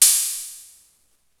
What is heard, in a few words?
YAMAHA, DRUMS PERC; Rubber Chicken Software Sample Bank